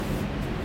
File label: fabric